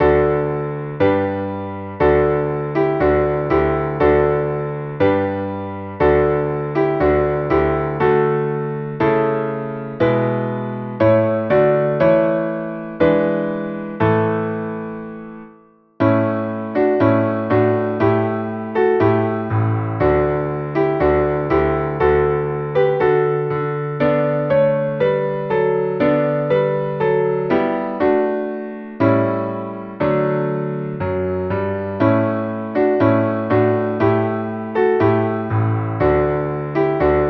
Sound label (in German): Klavier